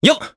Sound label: Attack, jp; voices; heroes; Evan; kingsraid